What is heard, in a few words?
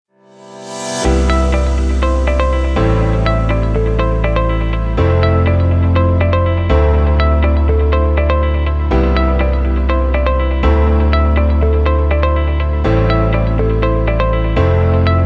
Upbeat